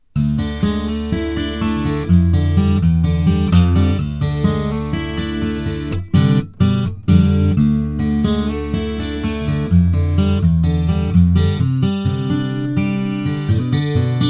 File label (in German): Fingers chorus, Westerngitarre mit Tonabnehmer; FGC-TA; C-TA VT Vintage Tint Transacoustic